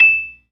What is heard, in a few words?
PERCUSSION, ToneWheel Organ; E-MU Formula 4000 Series Vol. 3; Analog Odyssey